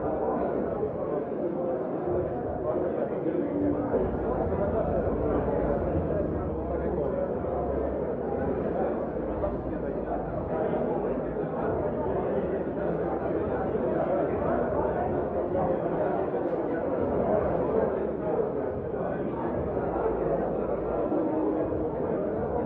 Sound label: crowd